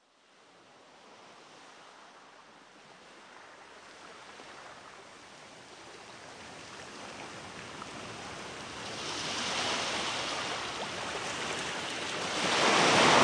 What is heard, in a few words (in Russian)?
Zvuki prirody Kriki chaek pod wum morskogo priboja